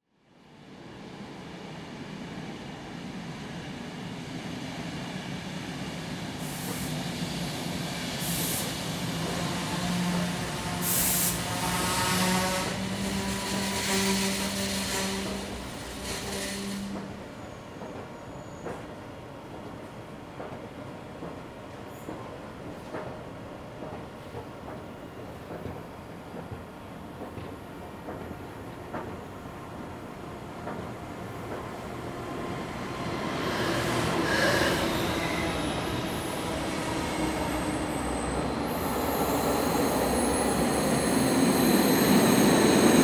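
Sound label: zheleznodorozhnij vokzal, railway station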